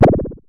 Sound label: Synare Filter; Star Instruments Synare 3